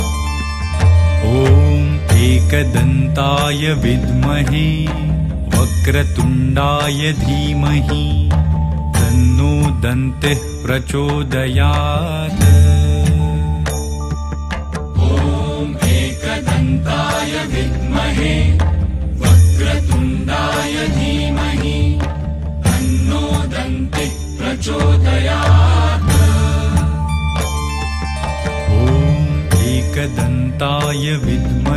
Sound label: Ganesh Gayatri Mantra